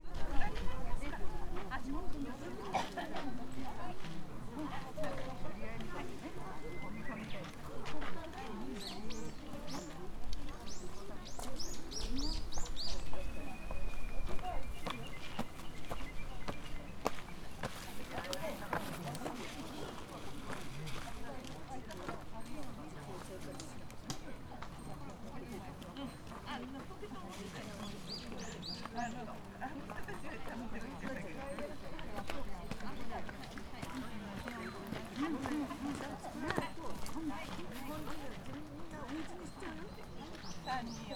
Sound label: hanamiyama mountain top